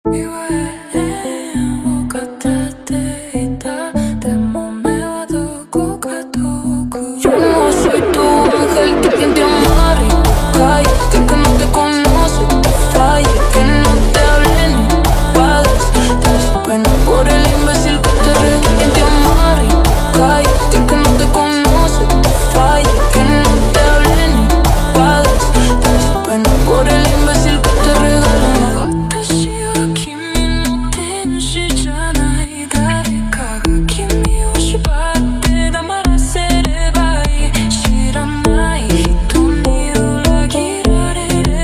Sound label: Jumpstyle super Slowed